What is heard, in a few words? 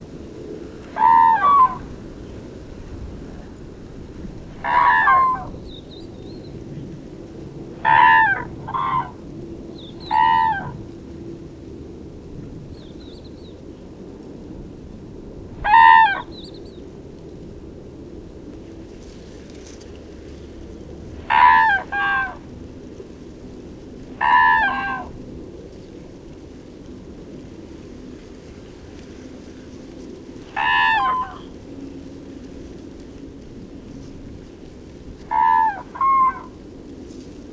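common crane voice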